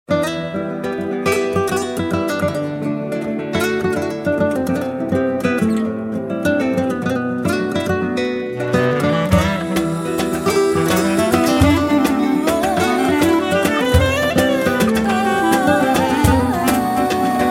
turkish tune RINGTONE; saz; gitar

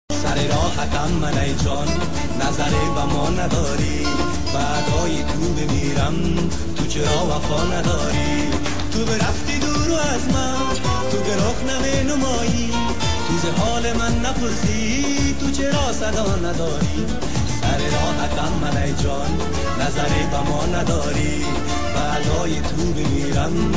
Ringtone afghan

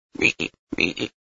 Frog

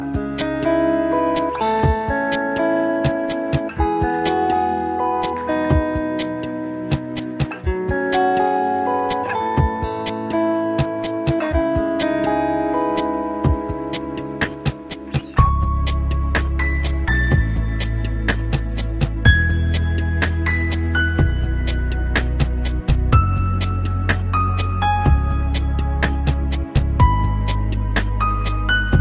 calm ringtone

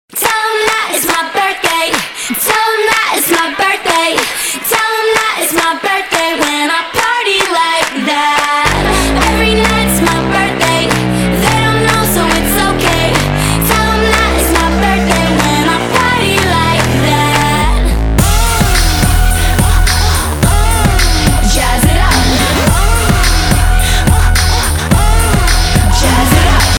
Birthday, ringtone

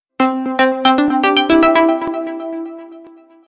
htc message 3d, ringtone

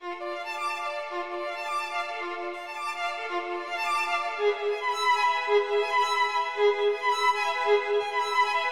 harmonic arpeggio Ringtone

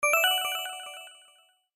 girly sms ringtone reverb

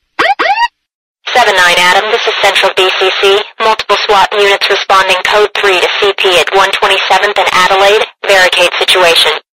usa police intercome

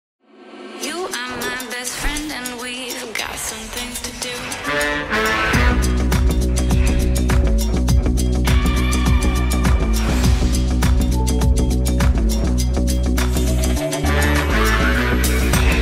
iphone x official ringtone; apple

